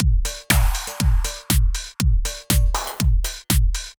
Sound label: French Drumloop; Drumloops; Dr. Fink's Funk Factory